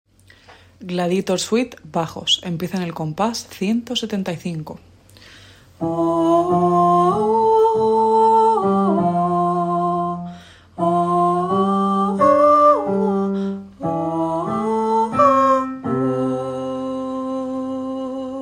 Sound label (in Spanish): suite baj; Orchestral Suite